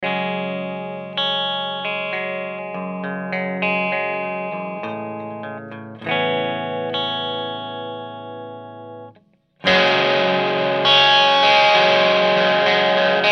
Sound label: nexi overdrive amp